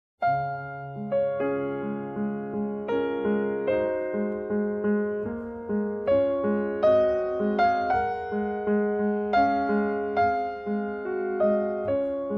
Préludes, Sostenuto in D-Flat Major; Op. 28 No. 15; Raindrop

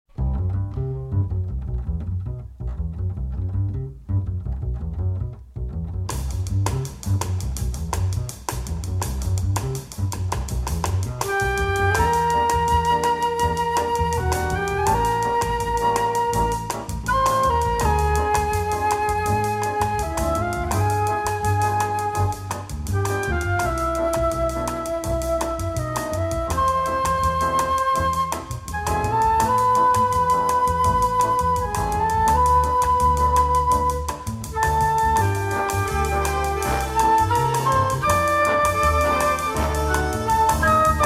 Bossa Fluta

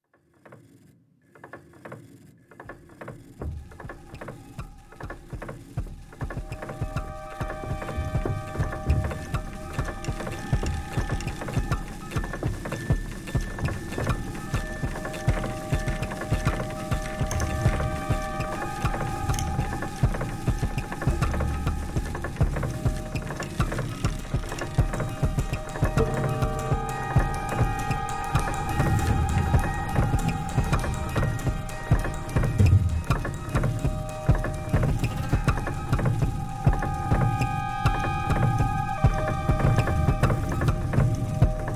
Piano; Folie

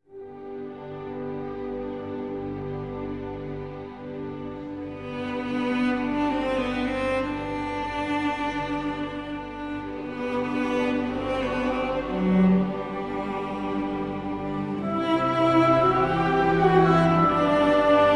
Wiegenlied, For Orchestra

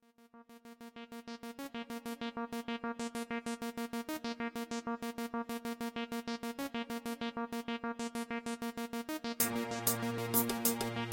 Instrumental

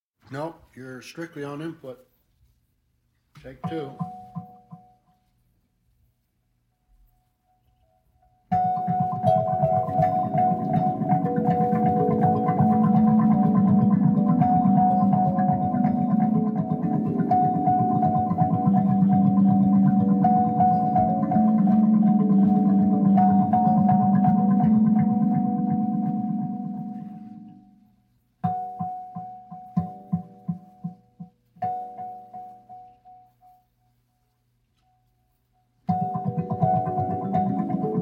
Kalimba

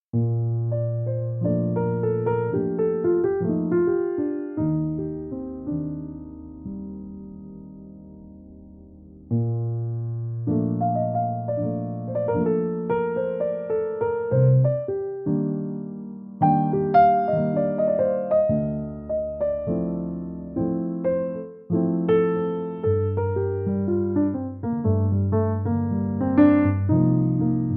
Chilled Jazz

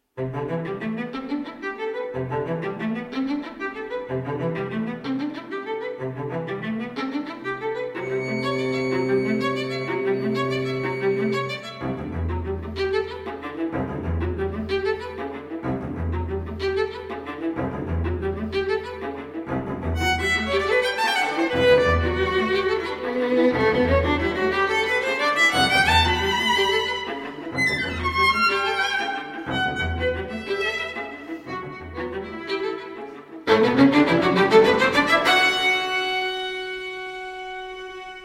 String Quartet; No. 2, Images I. Reyð genta

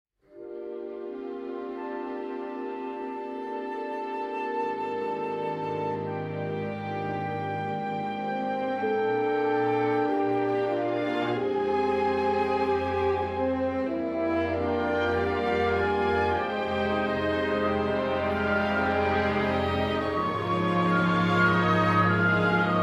Chamber Orchestra, Lullaby; Croatian Chamber Orchestra

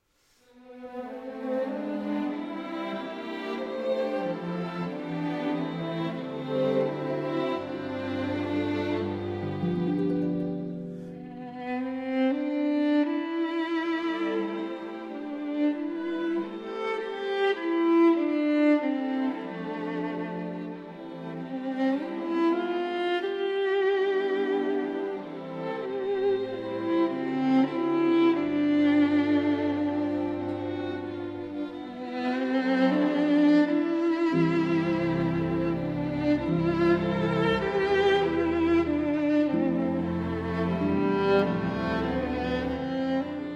For Solo Violin, Harp and String Orchestra; Danny Boy; Traditional

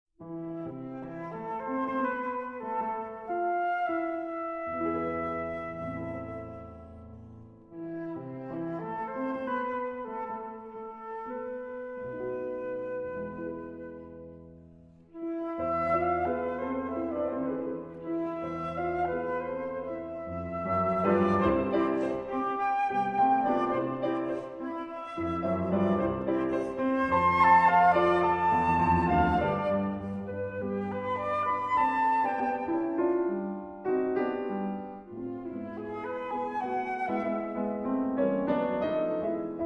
Romances For Oboe and Piano, Nicht Schnell; Three Romances Op. 94

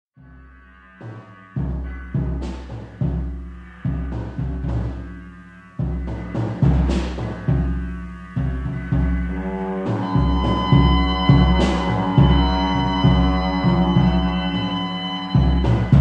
Chord Fanfare

